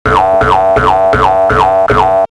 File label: pogo